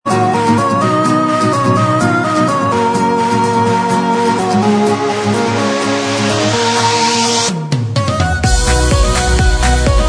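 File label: instrumental, backing track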